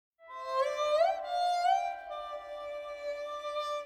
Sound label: String Sarangi, 122, C